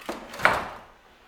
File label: Antique Loom In Use Weaving; Electa Quinney